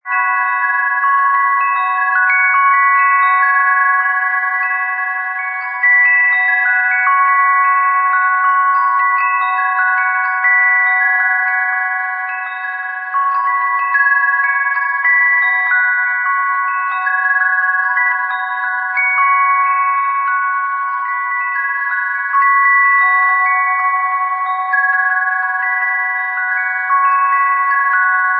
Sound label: ancient echo wind chime